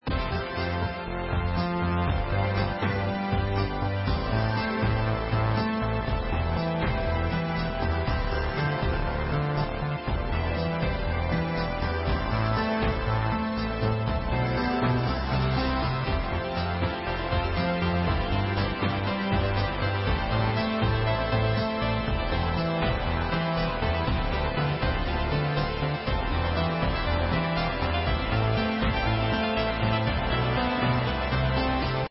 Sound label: menu; inlogic-arcticpong